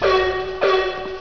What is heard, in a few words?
alarm; snowdam